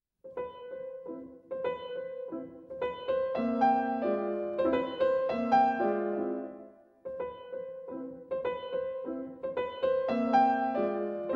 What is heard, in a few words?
Piano Sonata in C-Major, Rondo; Piano Sonata No. 2